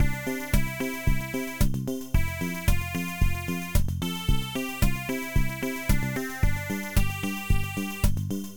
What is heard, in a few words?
Disco